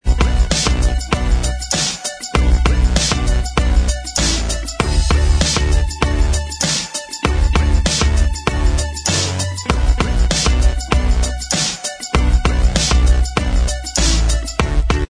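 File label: instrumental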